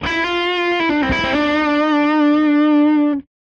Stadium Reverb Electric Guitar Dry; Virtual Room Reveb; Syntheway

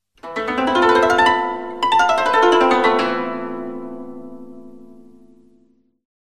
Koto Glissando Ascending Descending Straight; ShamiKoto; Syntheway

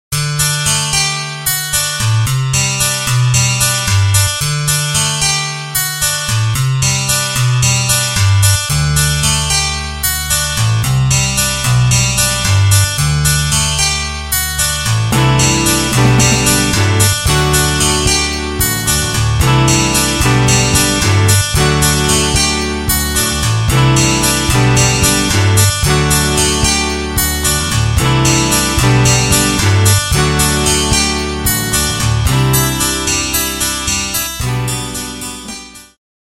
Steel String Twelve String Guitar Hollow Body; Hollow Body Electric Guita; GuitarTempus; Syntheway

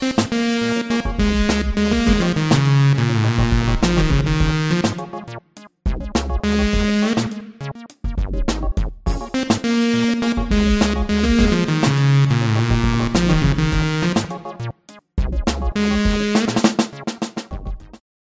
LEAD Saw Lead; Synthractive; syntheway

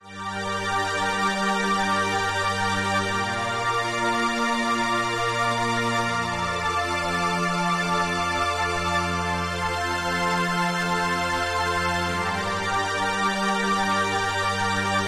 PAD Sequencing; Synthelium; Cognitive; syntheway

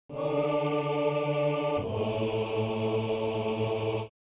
Octave Aahs; Syntheway

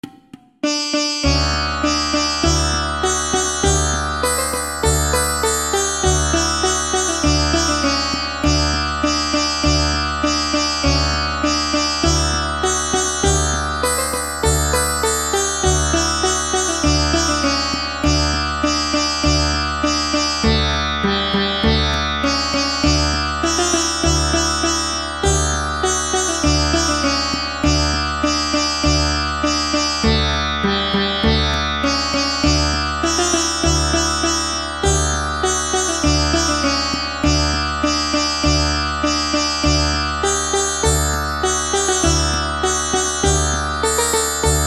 Sitar Model 2; Sitargen; syntheway

